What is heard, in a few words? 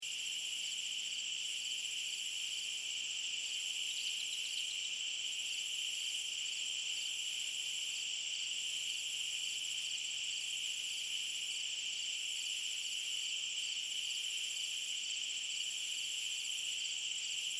Night Ambience